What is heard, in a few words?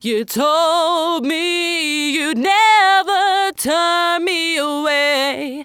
Pitch Corr Bypass